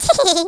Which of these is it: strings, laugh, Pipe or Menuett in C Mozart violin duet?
laugh